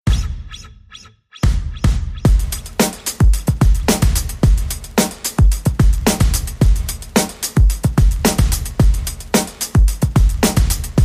Expire Beat

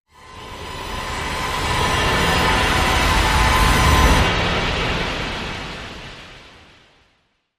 Tension Swell

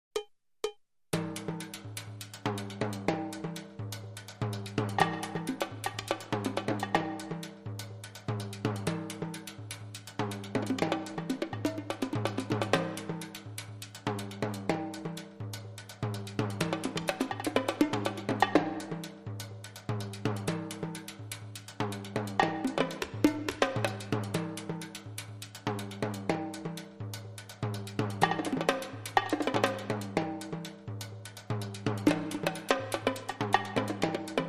Beatnik Loop

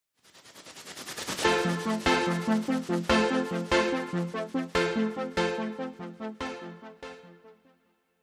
Quirky, Sting

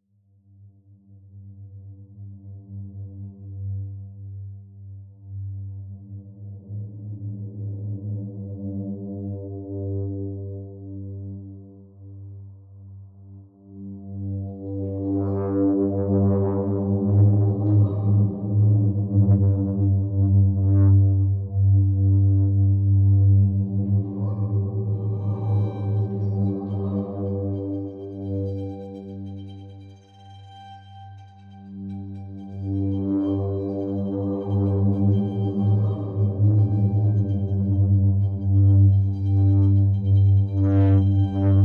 Pads Strings and SFX Only

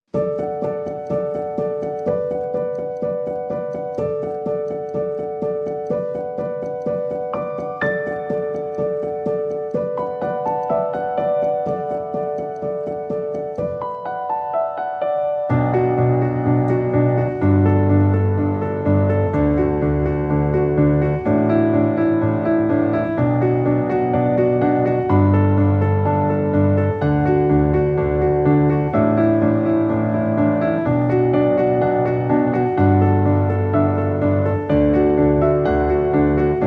Piano Only

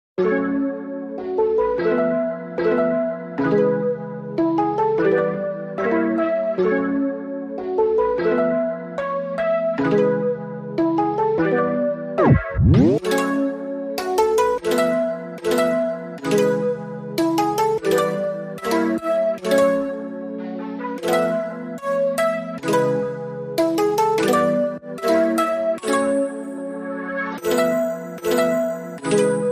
No Drums No Bass

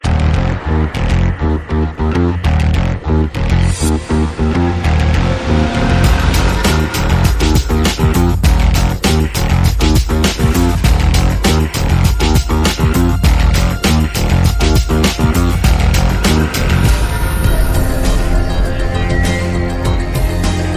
Space Funk

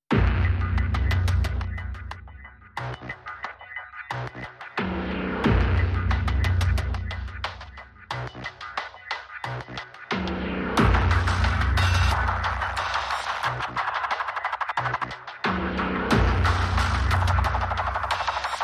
No Dubstep Synths

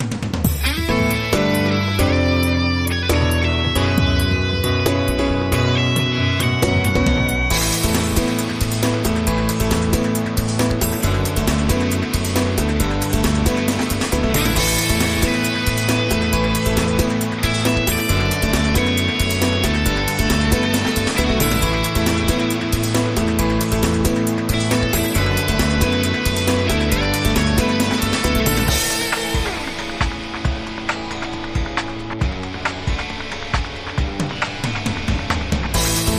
Breaking News Promo

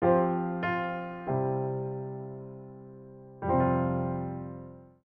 Introspective Piano Etude

